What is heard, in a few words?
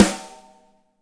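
DRY snare, NATURAL KIT; Spectrasonics; Burning Grooves CD2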